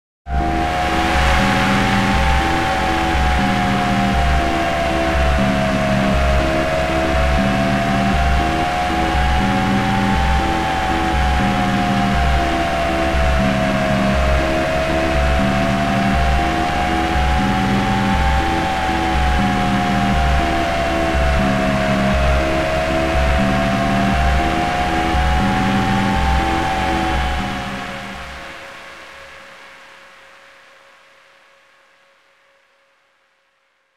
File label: Fire